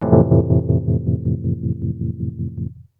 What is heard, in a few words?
wurl del, dx7